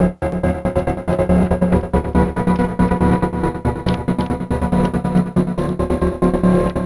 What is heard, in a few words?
Electronica Drumloop